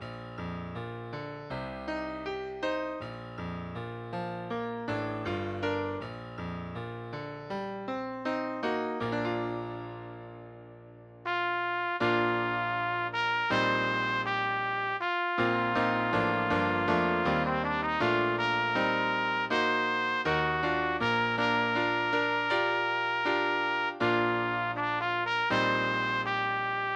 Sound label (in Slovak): Romanca pre trúbku a klavír